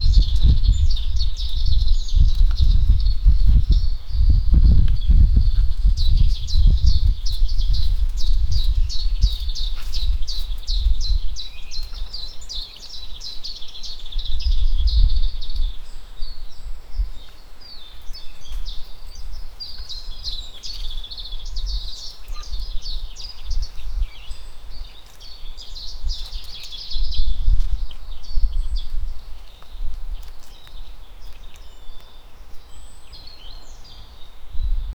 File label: walk along a path in, summer